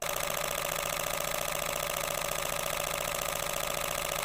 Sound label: Canon EOS 1D X Mark II Burst Live View